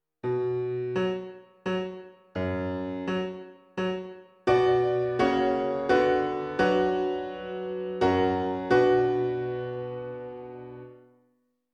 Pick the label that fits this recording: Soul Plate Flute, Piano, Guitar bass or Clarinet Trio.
Piano